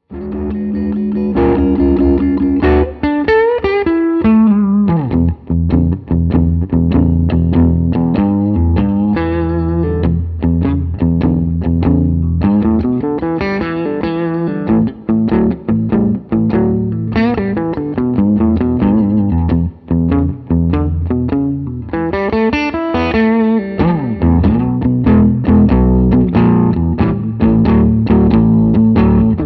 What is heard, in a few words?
V33 212 Blues